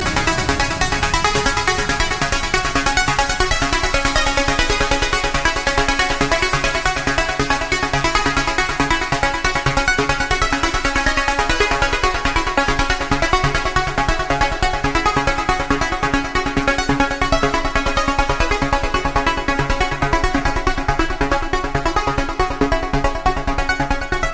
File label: Techno